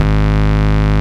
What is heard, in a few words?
Ultimate Bass G# Root G#; Vengeance Samples